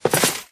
step

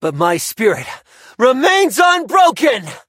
hurt vo

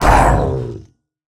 ravager hurt; Minecraft

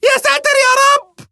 hurt vo; 芬克斯; 神话

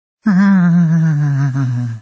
Emote Facepalm Goblin

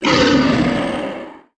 Frostwyrm yes attack; 冰霜巨龙（魔兽争霸3）; 亡灵; Wyrm Breath; 冰龙吐息; 攻击时

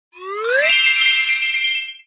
Emote Happy Goblin

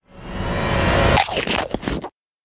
Pig Eats Biscuit

